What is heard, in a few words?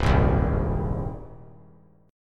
C Minor Sharp 5th C 3C,3F,3A,3D; strummed; JGuitar